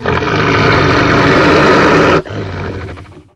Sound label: watcher chase